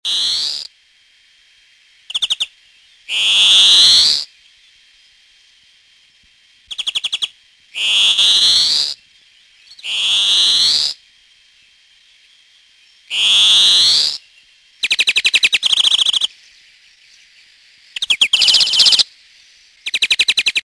verdier

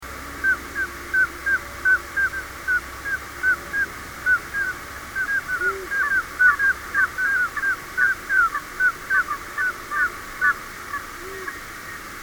macreuse noire melanitta nigra

